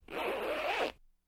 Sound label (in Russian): Rasstegivaetsya molniya